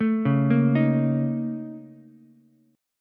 A sharp Minor Major 11th, A sharp, down, Ukulele, C6; arpeggiated